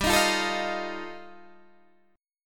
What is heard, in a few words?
G 7th Suspended 2nd Sharp 5th G chord, Guitar Open D